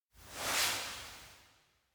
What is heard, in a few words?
hand release; aino